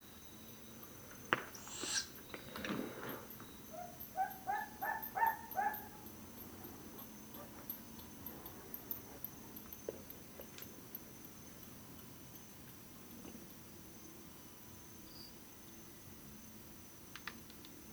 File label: Humes Owl Guarding Calls Of Female Begging Calls Of Nestling